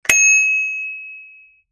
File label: ready; cooked